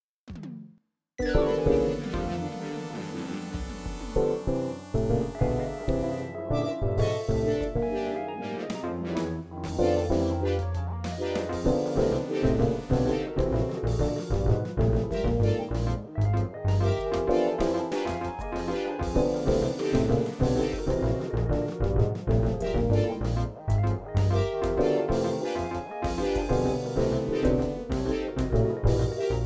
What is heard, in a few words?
blues deconstructed